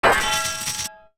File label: Door Boss Unlock; zelda; OOT